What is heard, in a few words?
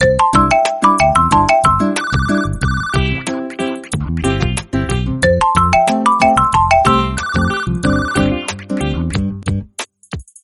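lovely cute chirpy call notification tone ringtone; galaxy ringtones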